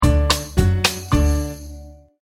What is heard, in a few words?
Goal; Finders Keepers The Adventures of Floyd Finders